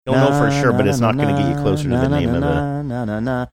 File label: singing portion